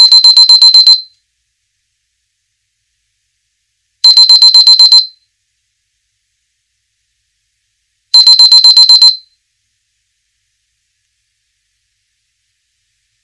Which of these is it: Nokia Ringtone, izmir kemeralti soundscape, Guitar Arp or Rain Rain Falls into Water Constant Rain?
Nokia Ringtone